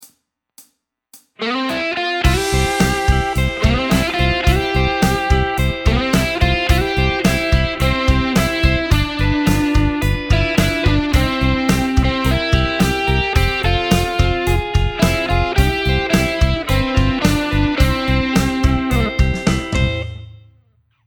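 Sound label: backing arpeggio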